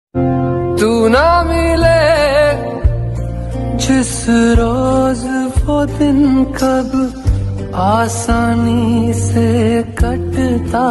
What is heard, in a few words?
Sad Ringtone